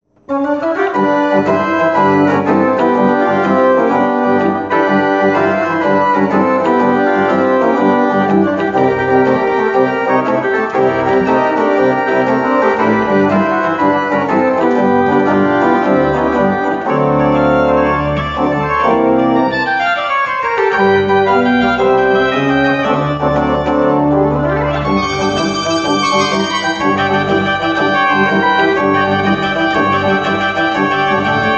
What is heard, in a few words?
Jazz Pop Blues